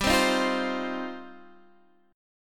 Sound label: G 7th Suspended 4th Sharp 5th, Guitar DADGAD